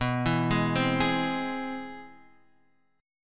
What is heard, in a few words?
C Augmented Major 7th B